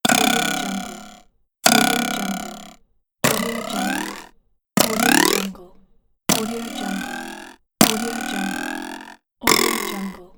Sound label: cartoon twang pack